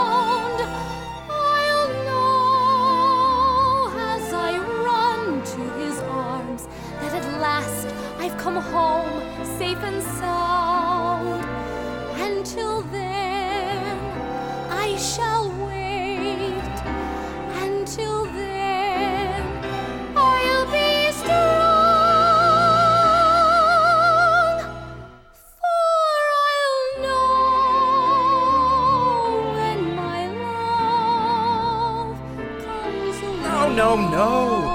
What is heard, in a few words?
Vocal; The School Musical 2024/25 - Guys & Dolls Jr.